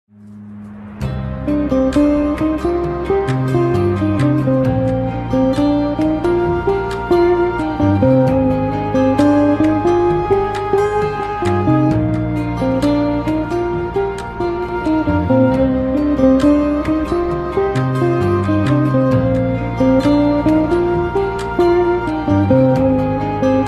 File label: Ringtone; ترکی